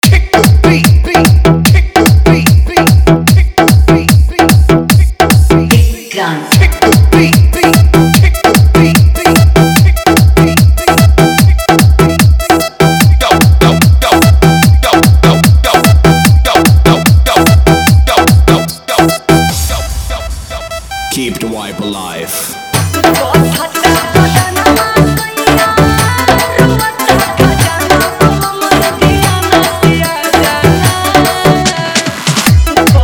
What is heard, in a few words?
Bhojpuri Remix